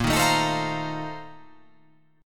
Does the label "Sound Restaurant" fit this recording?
No